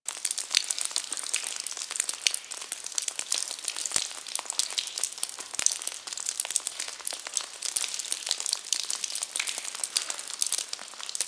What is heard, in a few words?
Drip Loop; Ambience; Props; Warframe; The New War; Zariman; Quests